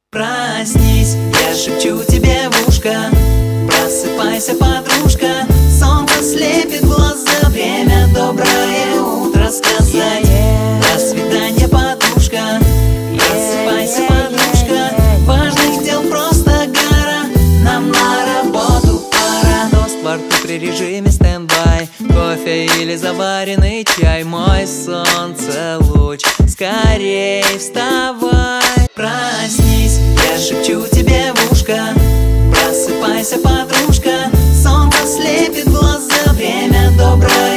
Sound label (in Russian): muzyka dlya budilnika prosypaysya podruzhka